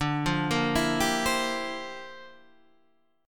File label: G Minor 13th D